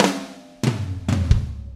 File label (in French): batterie